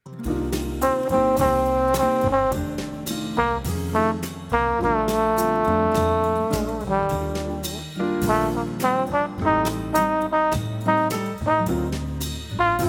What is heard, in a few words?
solo model